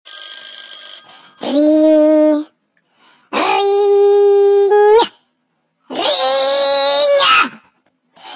baby screaming; children

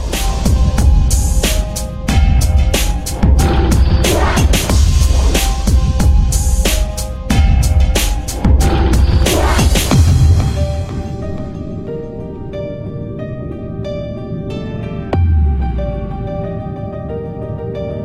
Dark Piano

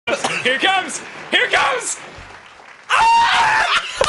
Scream

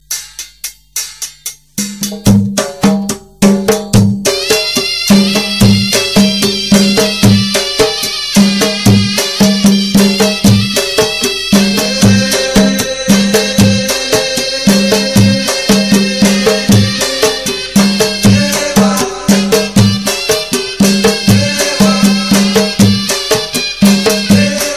bass king; hip hop

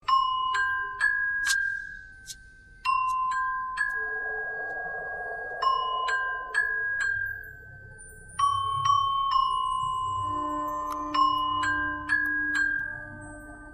eerie halloween

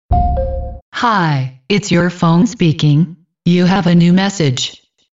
phone message; ringtones; sasuke

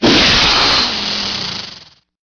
Message Tone

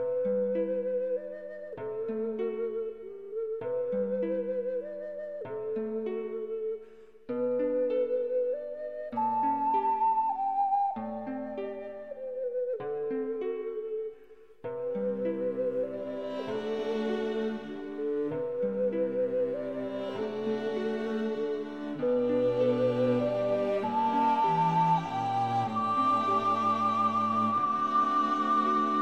lullaby

